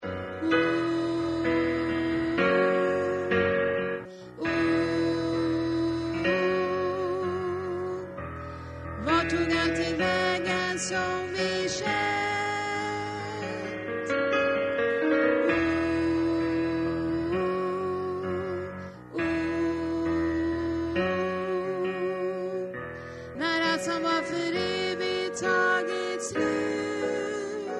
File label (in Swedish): bas